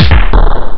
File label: small drum; Instruments